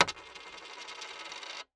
Coin Drop Spin Wood

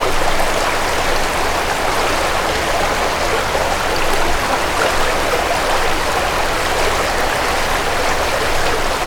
water river fast; sound effects one

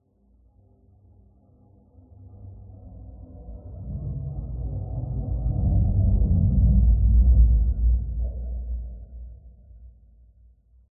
Transition Liftoff; PMSFX

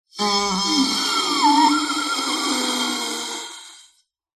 horror zombie monster or creature dying breath; zapsplat

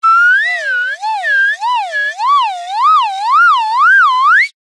cartoon slide whistle ascend climb wobble

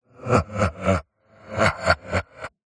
horror laugh male demonic wide heavily processed

